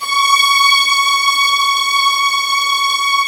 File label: A.C STR C#5; AKAI S-Series CD-ROM Sound Library VOL-8